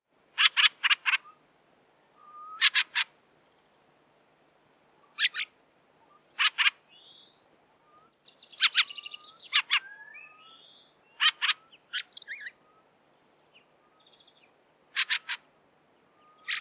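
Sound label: Drozd rjabinnik, fieldfare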